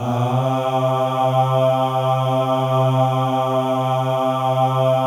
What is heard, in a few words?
MALE AHS, Symphony of Voices; Spectrasonics